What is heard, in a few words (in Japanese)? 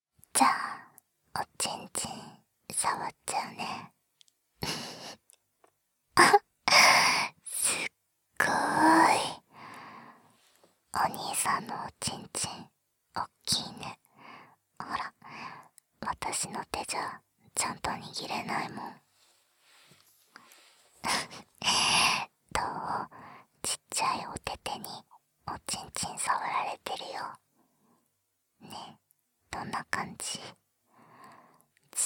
耳舐め手コキ, ASMR; すばるの耳舐め手コキ